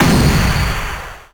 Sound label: 8bit explosion; Retro Games; SoundHolder